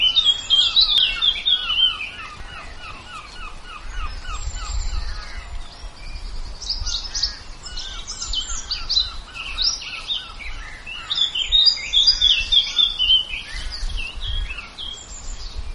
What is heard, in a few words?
birdsong; diversitree